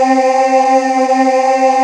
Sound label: Choir C4, VOICE; Best Service Gigapack 1